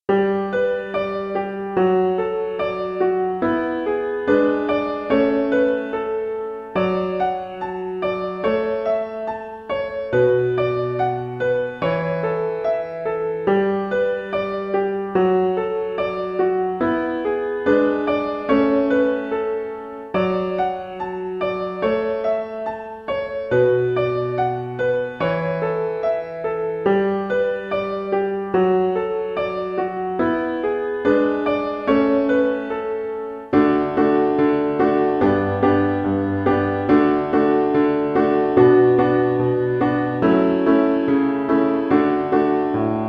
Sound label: Piano